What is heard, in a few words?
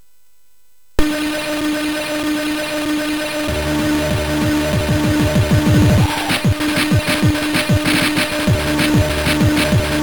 Low Energy, sid MOS6581R3; hvsc